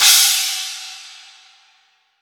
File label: roomy crash cymbal sound e key; drum sample